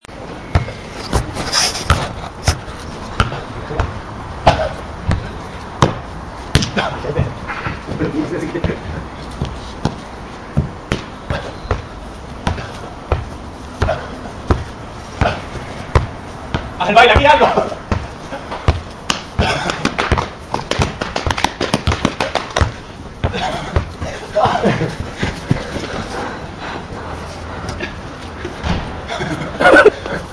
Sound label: Shuhplatterfussball; Yeray Garcia